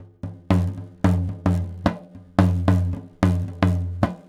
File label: 112 African Bembe; World; African Percussion